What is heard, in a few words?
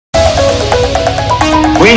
quack remix